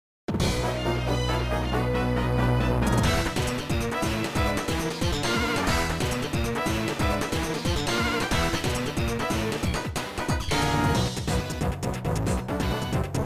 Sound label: encounter; pokerogue